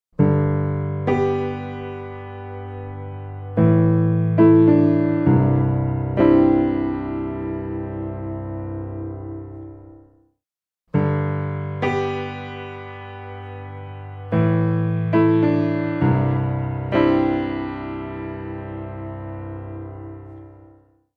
Shaping Tone

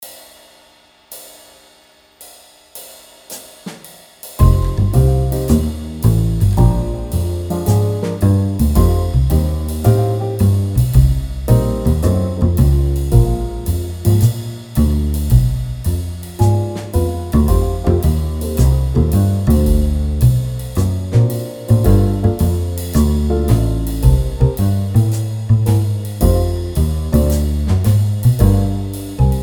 Little Swing

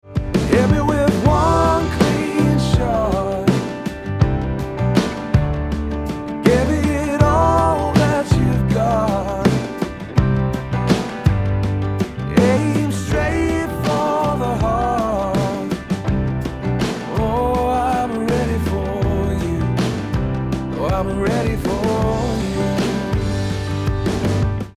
mastering music with much limiting; waves

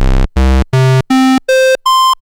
Sqr, Oscillator; unosynth

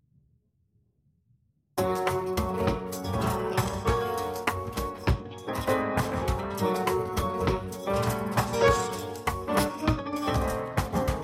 jazz; Chinese Style Jazz; Stable audio; suno

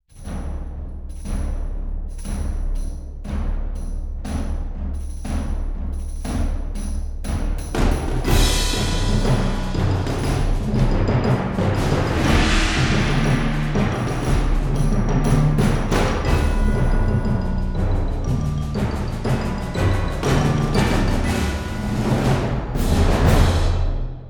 Perc; cinesamples; CinePerc

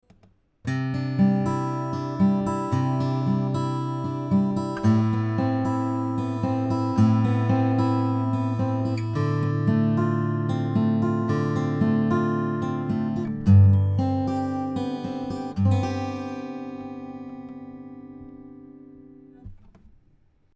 guitar test

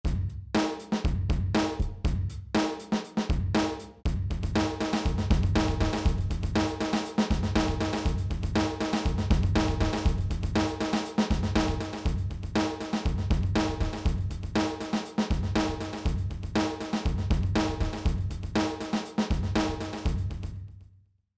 delay fx

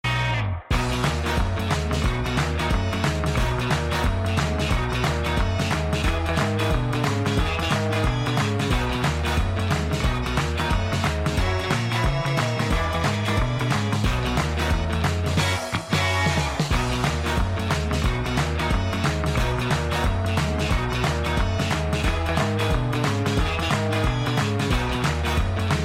rock, surf rock with organ and claps

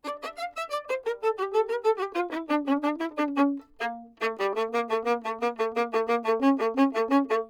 violin Piccato; earthworks

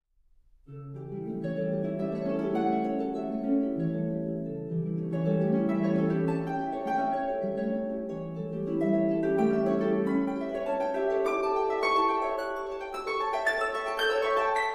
Strings Harps Strings; CineHarps; CineStrings CORE; cinesamples

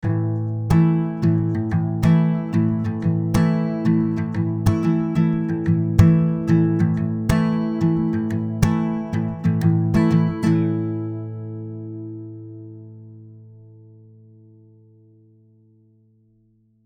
guitar dry audio; waves J37

